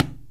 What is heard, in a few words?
SARANGI TAB